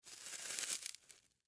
Foam Insert Rod Flower Decoration Art Craft; Foley; Miscellaneous Office; Offices; Blastwave

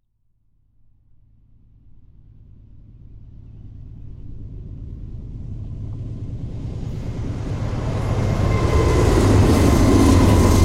Train Freight CN Rail By Long; Vehicles; Trains

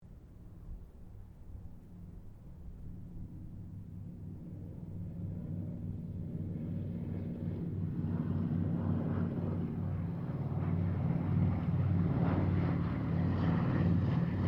C195 squadron 2 pass, Airplane; Mixed aircraft squadrons

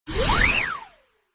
Whistle Whoosh

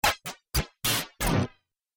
Droid Broken, robot sound effects; Sci-Fi Robot

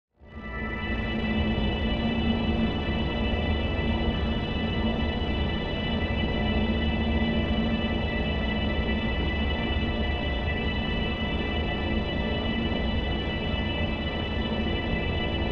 Drone Pulse Sputter Musical Chord; Production Element Imaging Element Texture Bed Atmosphere Synth; 5.1 STEREO MIX; Blastwave

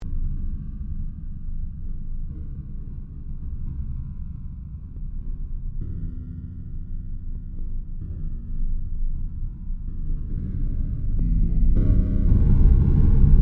CREATED AMBIENCE DEATH WHISPER; Artificial; Various ambience created through sound manipulation in FMOD

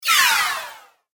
Arcane Magic Ice Blast; Magic Sound Effects

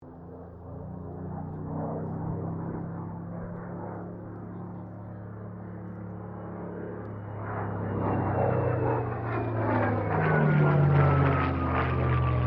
AIRCRAFT SUPERMARINE SPITFIRE MK-XVI PASS

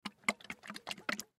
Can Shake; Food; Shaking; Foley; Shaking Sound Effects; Objects; Blastwave

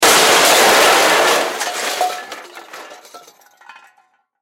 Crash Metal Debris Small; Debris (Sound Effects:Materials); Blastwave

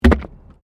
PLASTIC RUBBER PIPE; Materials

